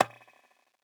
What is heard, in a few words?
conga; eddyflux; dough-samples